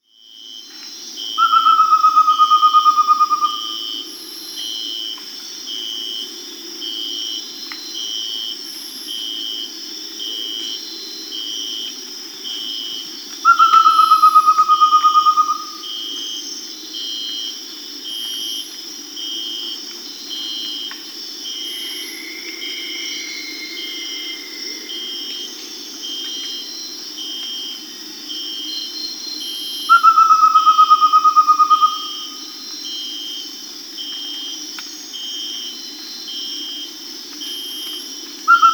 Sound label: Amazonian Pygmy-Owl (Glaucidium hardyi), Peru; Birds of the Amazon; S. America